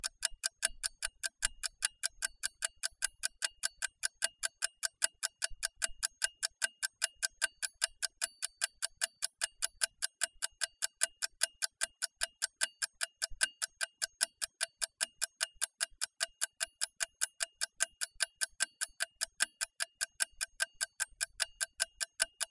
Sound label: Ticking clock